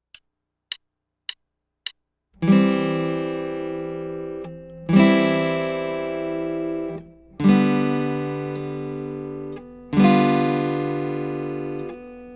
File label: Chimes